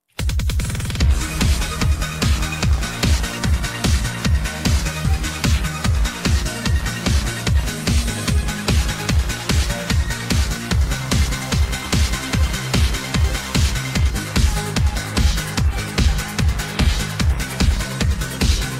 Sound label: Accompaniment